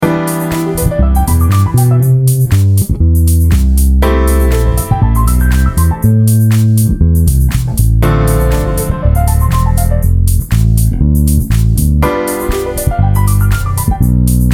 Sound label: bass Cmajor, the bass substitutes the iii chord (Emi7) for the I chord (Cma7) while the keyboardist plays it straight; a bassist's guide to chord substitutions